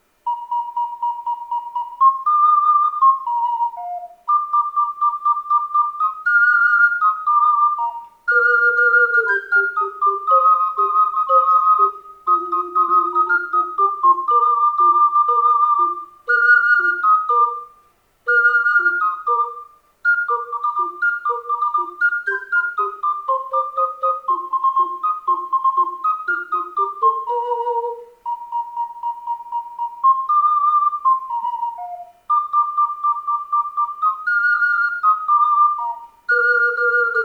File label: clarinet